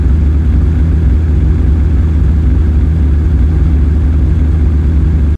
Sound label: Mercedes amg Gt Idle